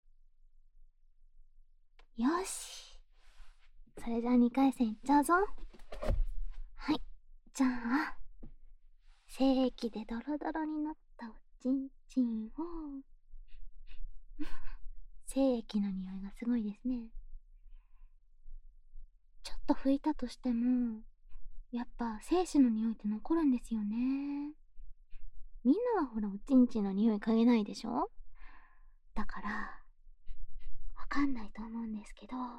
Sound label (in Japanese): お掃除フェラ; おちん〇んがイライラする